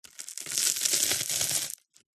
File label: food toast crush crunch; zapsplat